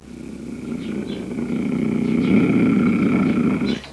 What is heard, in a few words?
cougar sounds; big cat